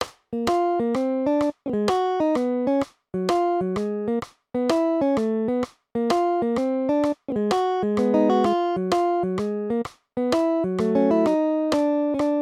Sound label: west coast blues; 3/4; Triplet 8th